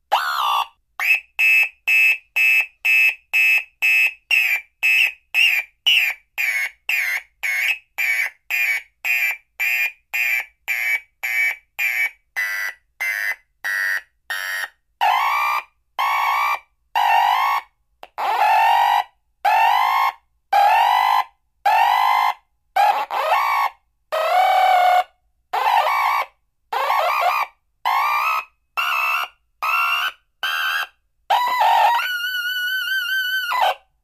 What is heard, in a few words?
Alarm Car Type 1; Modulating Fast Beeps And Chirps Speed Up And Slow Down, Alarm Slows Down And Starts Again 3x